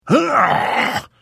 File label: Hit, Vo